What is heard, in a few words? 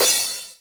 drum hit finish